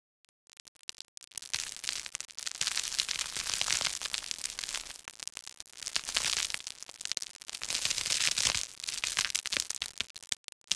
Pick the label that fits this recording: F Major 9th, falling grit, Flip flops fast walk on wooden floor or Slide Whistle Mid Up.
falling grit